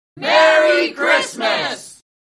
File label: Merry Christmas, Crowd Shout